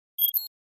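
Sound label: Flash Beep Electronic Double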